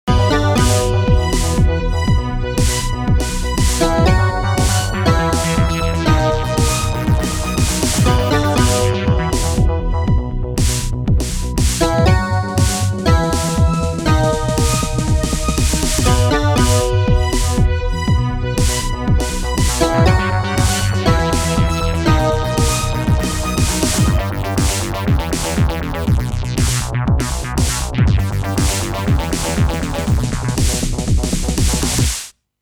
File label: Volca FM dubbed Volca Sample; Tobkit